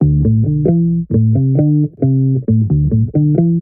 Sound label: bass, Loops, Phonky